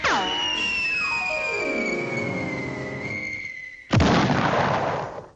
cartoon drop

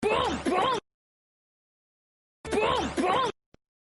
boom demon

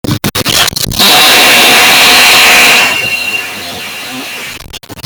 fart earrape

